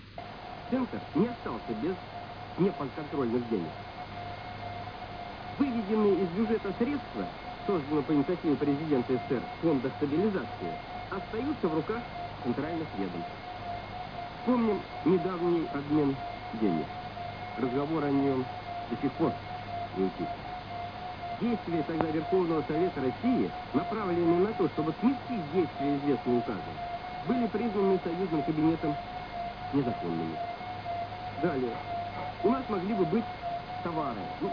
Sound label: ton 750hz, TONAL NOISE